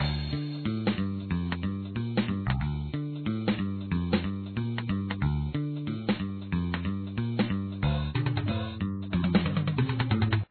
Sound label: Minigame Music; papas cupcakeria online windows gamerip 2013